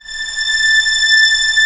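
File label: STR A0 PP, strings; Sound On Sound - Peter Siedleczek's Orchestra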